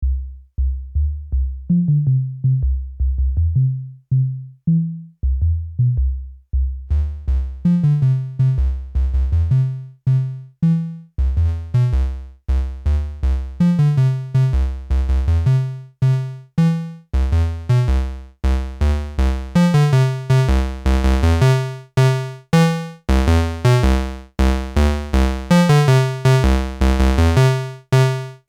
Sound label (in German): Malekko Manther Sub VCO Sine und Square